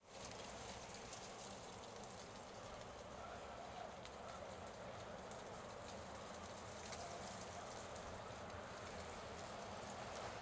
Wind, Soft Wind Howling, Soft Leaves Rustle on Concrete; Stereo; Sonik Sound Library; Spatial Countryside